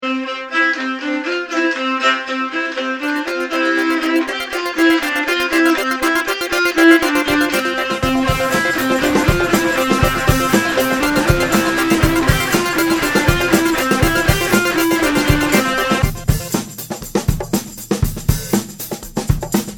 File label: Orchestra